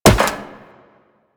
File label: Wall Jump Metal Perforated; Footsteps; Warframe; Tenno; Wall Run